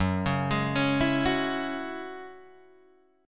Gb 7th Suspended 2nd Gb Guitar Open D

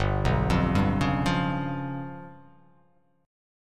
G 7th Flat 5th

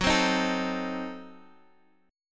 F sharp Diminished 7th F sharp, Guitar Open D; x,x,4,6,6,7

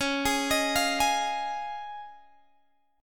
C sharp Major Add 9th, Guitar, Open G

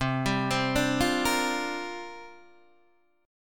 C 9th Suspended 4th C down Guitar Open D

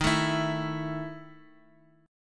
E Major 7th Suspended 4th Eb, chord

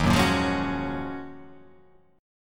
Eb Minor 11th Eb down; Ebm11 strummed; JGuitar

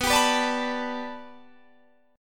B-Minor 7th Sharp 5th B down; strummed

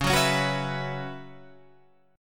B Suspended 2nd Suspended 4th Db

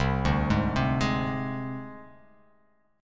C Suspended 2nd Sharp 5th, chord; Csus2#5 arpeggiated; JGuitar

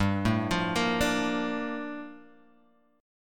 Bb Major 7th, G; Bbmaj7/G; arpeggiated; JGuitar

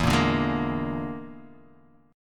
E 7th Flat 5th, E7b5 chord; strummed

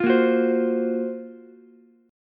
Gb Major Sharp 11th, Ukulele C6; GbM#11 strummed; JGuitar

